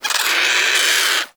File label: Avichaea Vocal Alerted; Hunting Critters